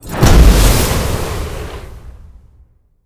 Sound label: aoe warmup; smite; gilgamesh